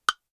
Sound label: wood impact